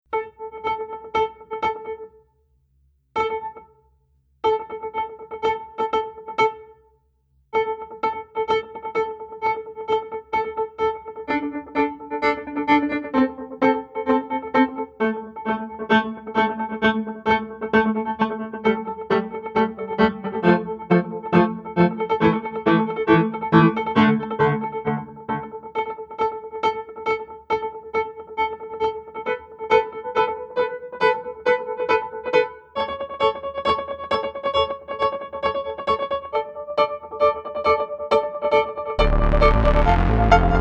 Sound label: storm; forming and storming